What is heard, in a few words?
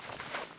usp draw; czero opforce